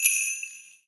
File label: Jingle Bells; Shakers; Cymatics; PHARAOH Beta Pack; Pharaoh Premium Drum Samples (BETA)